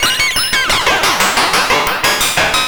Serge, Synths Arpeggios; Electronic Point Blank

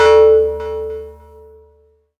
technosyn2, Techno Trance; Ueberschall; SYNTH

